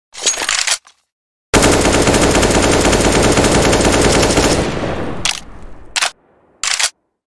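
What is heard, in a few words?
ak-47 bolt, shooting, magazine; cs go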